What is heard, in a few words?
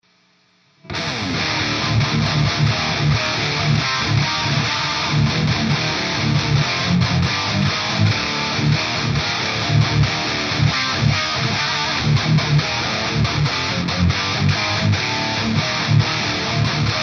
marshal double track riff; JVM 205 Vs. JCM 800